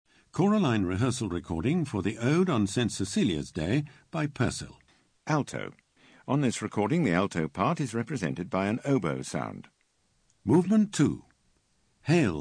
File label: Alto; Purcell Ode on St Cecelia